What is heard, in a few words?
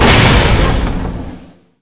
train door stop